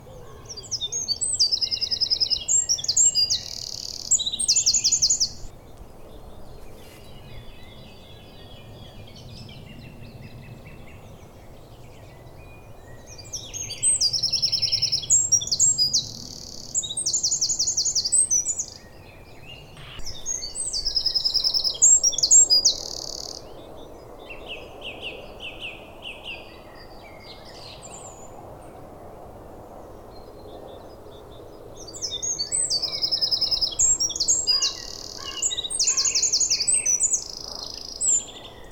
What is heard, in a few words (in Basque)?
txepetxa, Cochín, Troglodytes troglodytes, Canto; Observatorio de aves